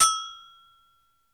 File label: AGOGO; Crypton - DrumBase5000